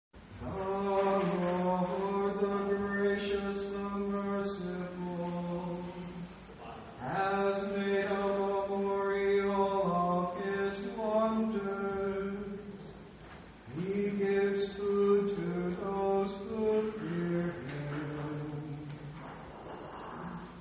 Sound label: Communion Antiphon